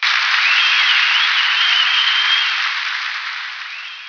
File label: applause